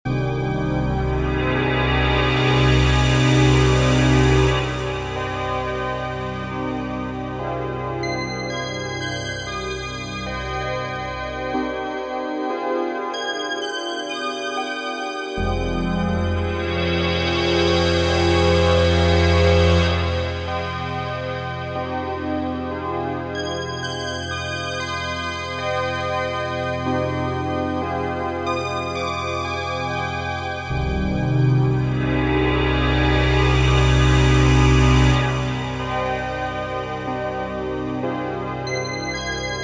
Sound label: Single Synth Loop; Drama; The Spire